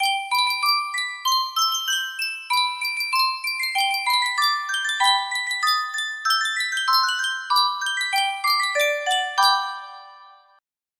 sankyo miniature music box, music box melody

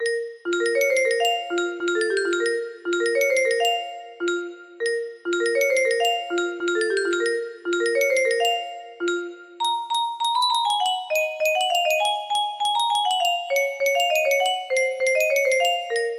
melody; caprice 24

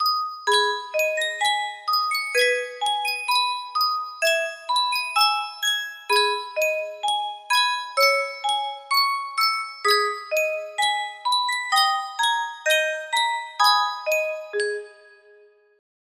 Sankyo Custom Tune Music Box, music box melody

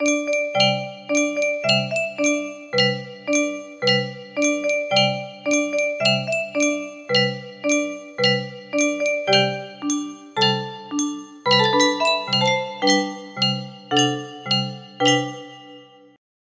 5 Semitones Down, music box melody

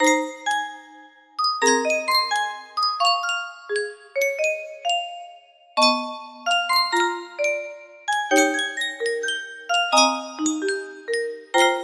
music box melody, 50 notes

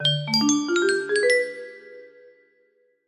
level up, music box

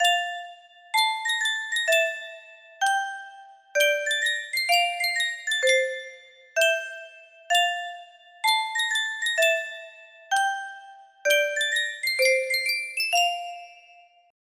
Sankyo custom tune music box; in the night garden

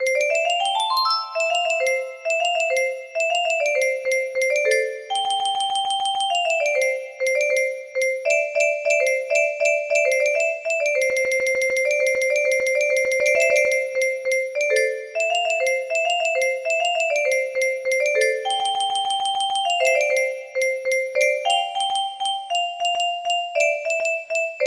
music box song

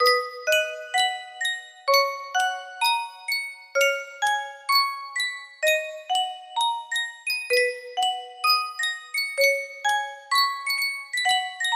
yunsheng music box, melody; kpop demon hunters

